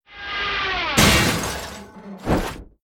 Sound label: Gag Hit Anvil; Toontown Online